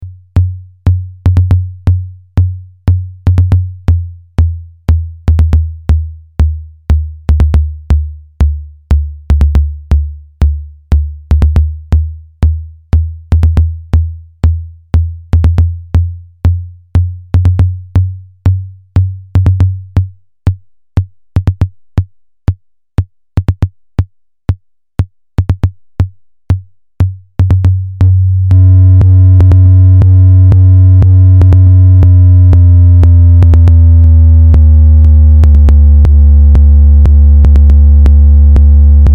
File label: TB2 TOM